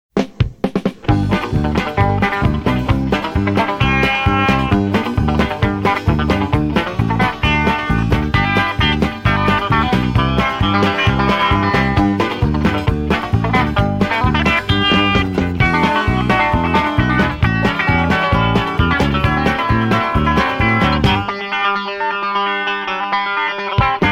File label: Country Tele